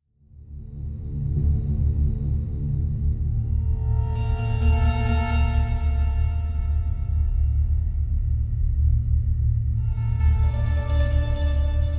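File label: Fortress Entrance Ambient, game music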